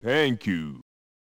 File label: COIN; the ninja kids; arcade